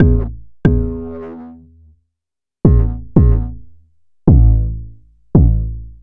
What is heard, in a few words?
Kick First Recs